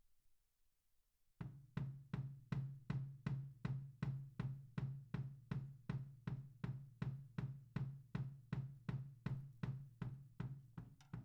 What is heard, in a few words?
Snare Top, 160 BPM